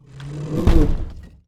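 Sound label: elephant large, weapon; Battle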